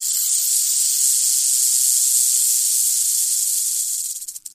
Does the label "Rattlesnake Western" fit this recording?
Yes